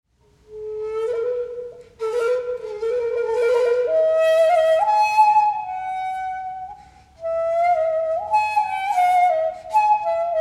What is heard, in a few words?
Solo Improvisation